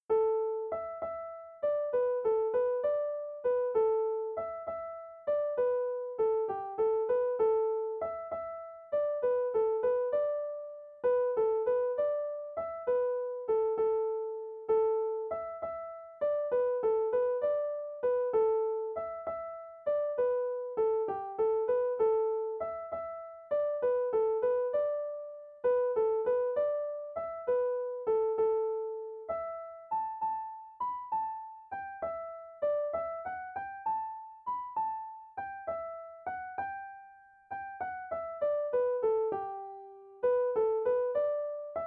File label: slide slow, irish music; okeefes slide